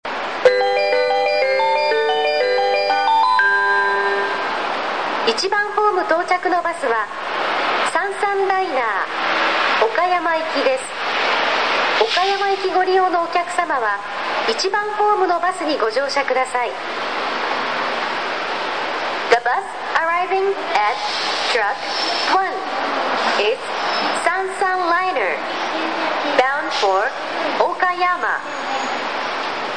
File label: bus center; １番ホーム案内放送; 広島バスセンター; 広島そごう３階にあるバスターミナルで、高速バスだけではなく一般路線バスも発着します。